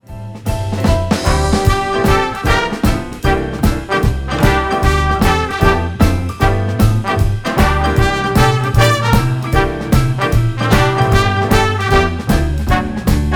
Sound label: Blues big band arrangement